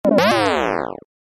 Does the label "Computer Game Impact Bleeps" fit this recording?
Yes